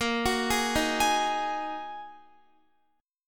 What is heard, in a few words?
Bb 7th Suspended 4th Sharp 5th Bb Guitar 4E,4A,5D,5G,5C,6F; Bb7sus4#5 arpeggiated; x,13,16,13,15,15